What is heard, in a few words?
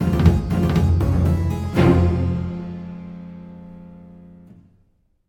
String; Assassin's Creed Unity Dead Kings